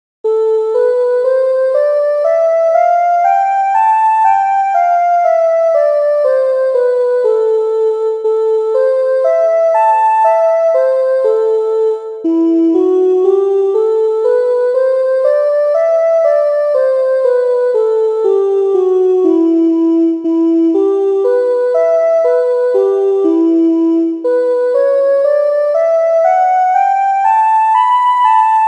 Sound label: Natural Minor exercise